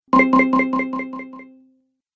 App Delay, ping; multimedia

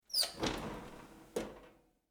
Household Stove Door Open Oven, kitchen

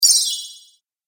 Snow Queen Spell, magic; Magic Sound Effects

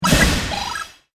Digital Impact; miscellaneous impact; Miscellaneous Impact Sound Effects

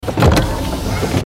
Sci Fi Robot Machine Servo Motors; Robots

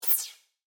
Pneumatic Hose, Scifi, Disconnect, Expel; Spacecraft; BlastWave

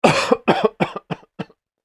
Man Coughing; Clearing Throat Sound Effects; human sound effect; Andrew Fleming

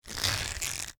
Rope Twist Heavy, Cable; Hand Tools; BlastWave

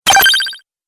Arcade 8Bit Robot Fx, video game

